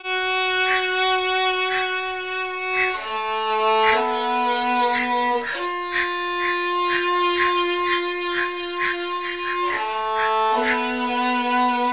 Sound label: Castanets Violin; speed 0.56; tsmtoolbox; phasevocoder